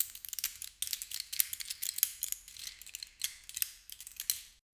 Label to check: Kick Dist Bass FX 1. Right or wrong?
Wrong